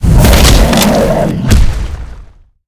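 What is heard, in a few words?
pb senior death, monster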